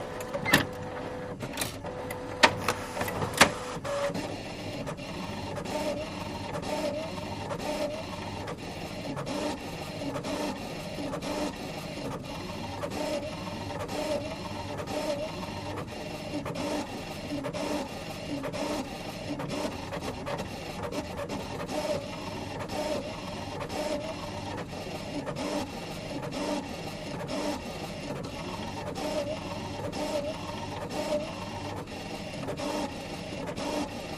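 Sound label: Machine Printer Click; printers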